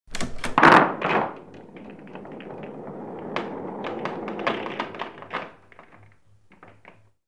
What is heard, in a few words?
pool balls